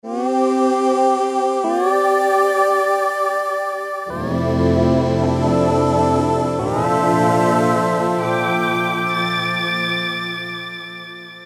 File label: Soundtrak, Kawai K1m